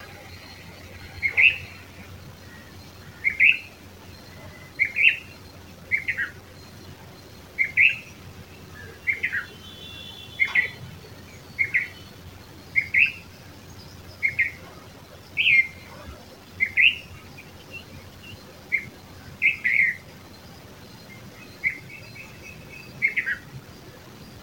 Red vented Bulbul Pycnonotus cafer

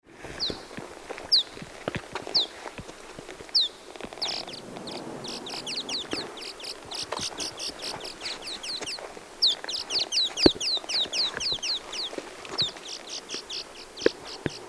Swallow Golden calls, El Aceitillar

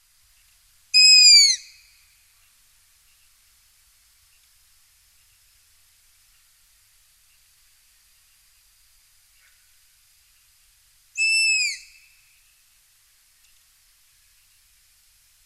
Striped Owl Asio clamator

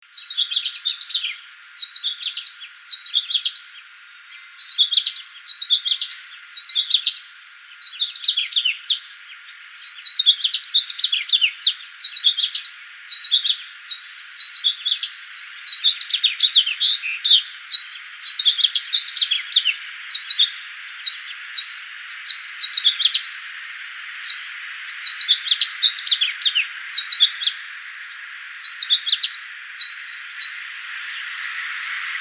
House Finch Haemorhous mexicanus

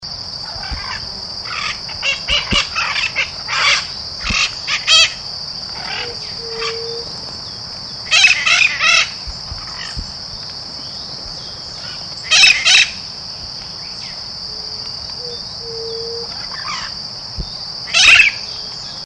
Mitred Parakeet

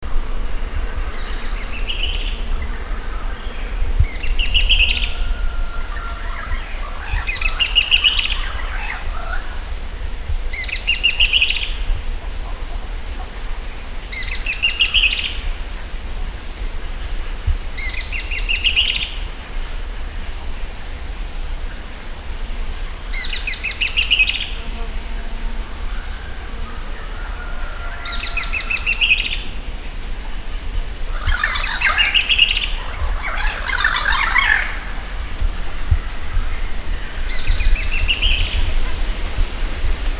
Loggerhead Kingbird

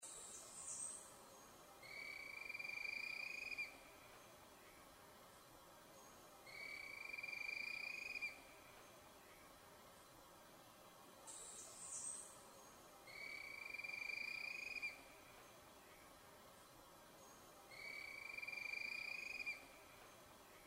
Puerto Rican Pewee; Gonzalez Collazo

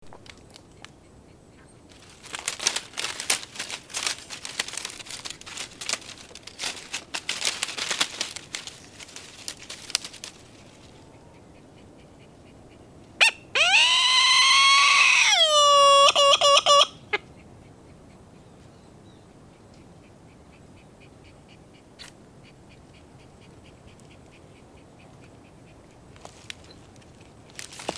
Kermadec Petrel

